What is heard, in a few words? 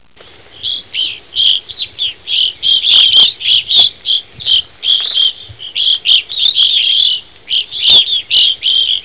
evening grosbeak